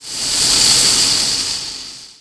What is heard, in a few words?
Steam; Finding Nemo; XBOX